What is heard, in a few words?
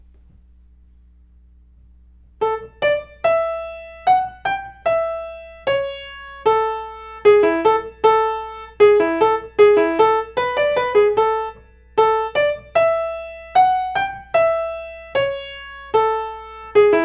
Haydn Quartet; ear training level 3